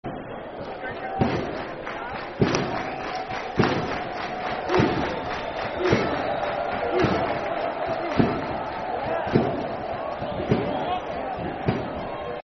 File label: Drum Clap War-Cry, Football Chant (Soccer Song), N. Ireland